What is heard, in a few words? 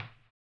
HAMBONE; Blues & Soul